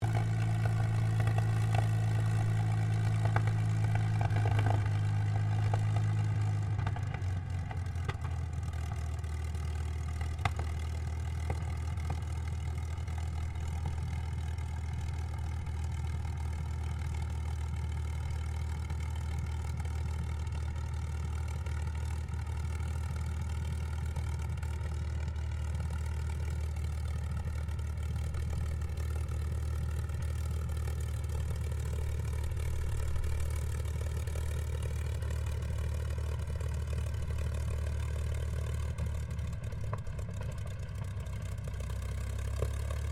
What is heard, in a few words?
AIRCRAFT CAC CA-18 MUSTANG MK23 OPERATING IDLE ROUGH STEREO, WW2 Mustang Fighter Plane